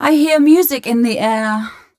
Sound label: f mel a flat, fem Vocals